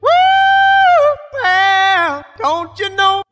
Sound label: woo well don't you know, SUNG; ooh ahh